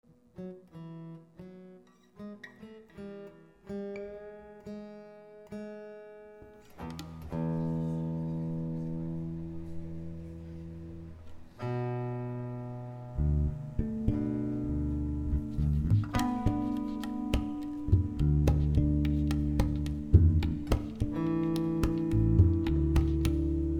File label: tuning